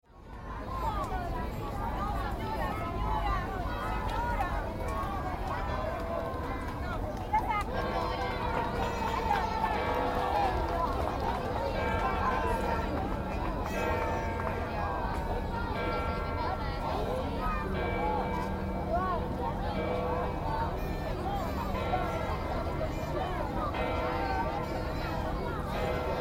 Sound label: Rome Kids At Carnival